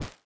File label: step sand